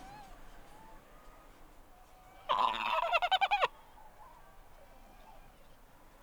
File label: Ant fur seal pup call